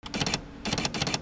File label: OFFICE